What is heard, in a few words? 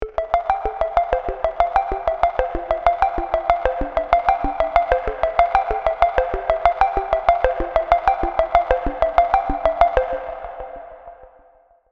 pluck; collapse into moment